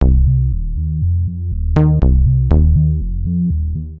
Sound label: Bass (C) 120 bpm; Music Loops; Ableton; Singularities